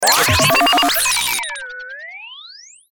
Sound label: Stinger Power Up; Stinger Power Up Down Modulation; Stingers